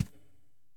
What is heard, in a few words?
reel Stop; fairyhansel